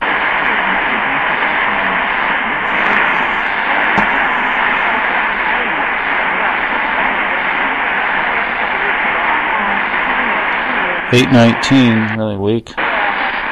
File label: weak mix recin Shanghai China; Owens Valley R2508 Target Towns